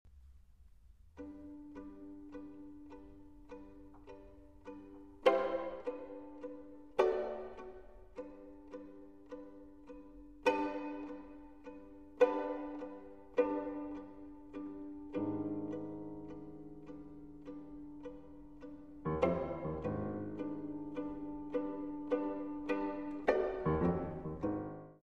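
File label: Sonata For Violin And Piano 2nd movement Blues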